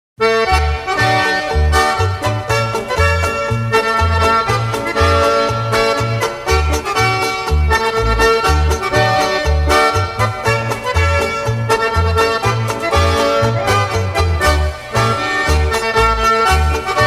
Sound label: Page Polka, accordions